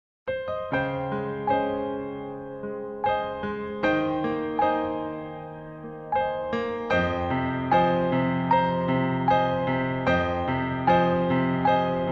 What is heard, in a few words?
outro short; jjjacksfilms